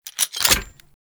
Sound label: Rifle Reload, Weapons; Warframe; Zariman Grn Wraith Rifle